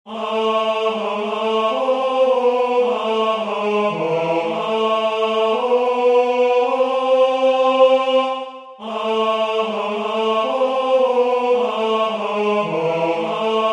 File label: tenor; fum fum fum